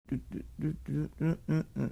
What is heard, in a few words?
singing series notes